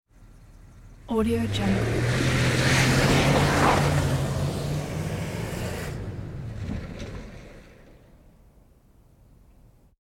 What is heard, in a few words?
1978 panel van drives away fast; hollywoodedge